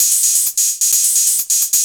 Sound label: hihat; magix